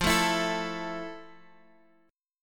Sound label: F Major 7th F, Guitar, Half Step Down